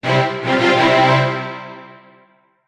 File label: ringtone strings